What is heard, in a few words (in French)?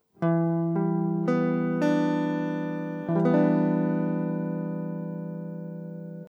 3eme Degre Em7, Harmonisation